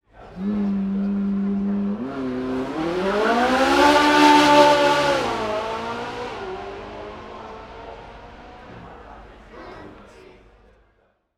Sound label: Ferrari 458 Italia